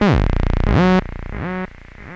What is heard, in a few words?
monodelay F# 89, Recorded with monotron delay and monotron - analogue ribbon synthesizer; synth loops